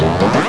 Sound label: STRETCH; sci-fi generator starting